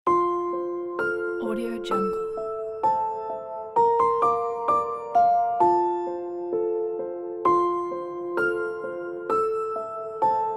lullaby

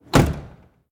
ambulance cabin door close; sound ideas

